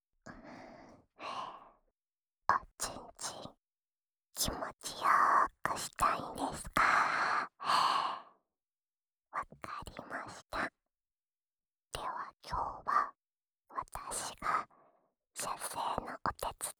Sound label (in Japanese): オナサポ用浅い耳舐め（右耳）; ASMR